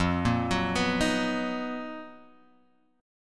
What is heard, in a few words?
B Major 7th F, chord; Bmaj7/F arpeggiated; JGuitar